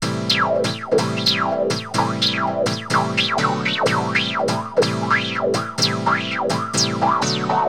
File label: piano house 125 A; eJay; Keys